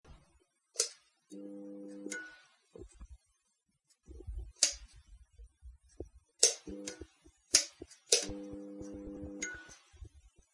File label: fluorescent light switch fuse